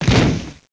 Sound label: Boom; Princess and the Pea; SoftKey